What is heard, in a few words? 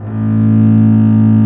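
DOUBLE BASS A1; AKAI S-Series CD-ROM Sound Library VOL-2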